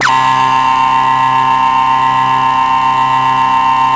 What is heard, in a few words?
Synth Hits, C3; Zero-G; Soundclash Nu Dance Electro; Acidized